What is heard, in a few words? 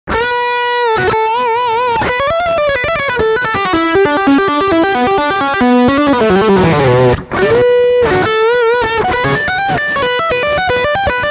Lick